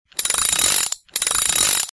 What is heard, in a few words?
repair; cpf painelveiculo